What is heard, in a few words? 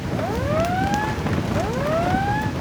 heavy cruiser damaged defend loop; Hearts of Iron IV Death or Dishonor